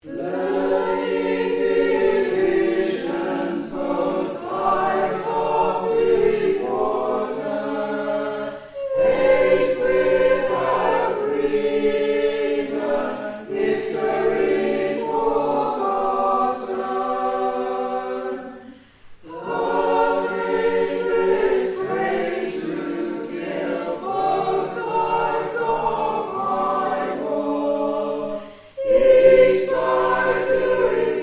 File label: both sides All